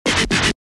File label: One Time Scratch; DJ sounds